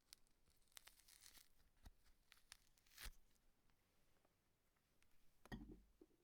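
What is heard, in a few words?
peeling object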